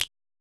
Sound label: perc, Roland MC-909